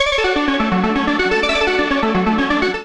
D Major 84 bpm; MIDI Clips; Tonal; Melodic; 16 Pennies; Ableton; Factory Packs; Chop and Swing

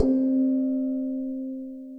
Glassophone, multisampled, majestic mallets; Ableton; Mood Reel

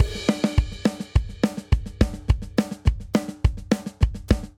Country Chorus 209 bpm, Drum; 4 4; MIDI Clips; Ableton; Factory Packs; Drum Booth

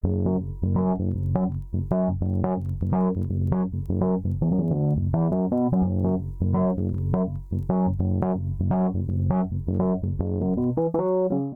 D Minor 85bpm, Bassline; MIDI Clips; Dirty Back Roads; Ableton; Factory Packs; Chop and Swing

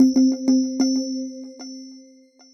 R&B keys; Synth Keys; Ableton; Factory Packs; Chop and Swing

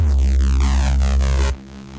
Impending Doom, brooding bass; Instruments; Ableton; Mood Reel

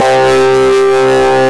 Future Blaster, Synth Lead; Bass; Ableton; Creative Extensions

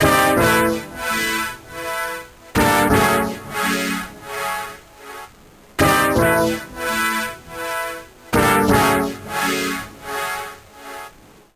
Old School Stab 83 bpm; MIDI Clips; Tonal; Melodic; Ableton; Factory Packs; Chop and Swing

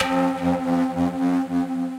Upper Perc, synth lead; Ableton; Glitch and Wash

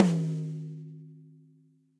Tom High; Drums; Drum Hits; Cop Style; Ableton; Session Drums Studio; MPE

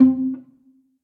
Short Pizzicato - String Quartet; Instruments; Individual; String Quartet by Spitfire Audio

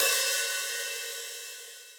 Hihat Open Warm And Low, Drum Hits; Standard Hits; Ableton; Factory Packs; Session Drums Club

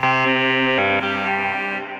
Transformed Voices; Hybrid Minds; Voice Box; Chromatic Voices

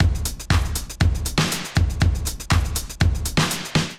Kit groove 120bpm, Drum Loops; Neon Midnight; Ableton; Singularities

